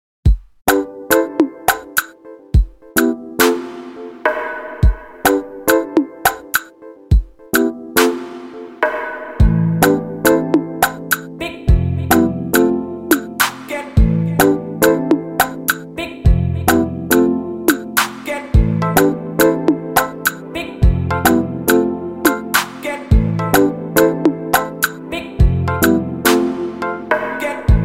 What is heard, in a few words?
Instrumental